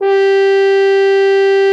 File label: BUCK G4; Xsample VOL-3